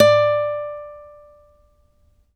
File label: ACC FG D, Acoustic Guitar; East West; Steve Stevens Guitar Samples Collection